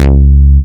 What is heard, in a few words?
Vengeance Ultimate Bass C#, Root C#